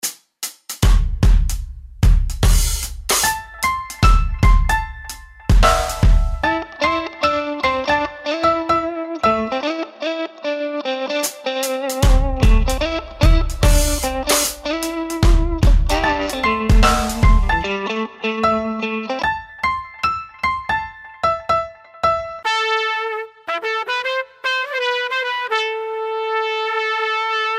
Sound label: MUSIC SAMPLES Raggea